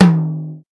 high tom drum d sharp key, Royality free tom drum sound tuned to the D# note. Loudest frequency: 519Hz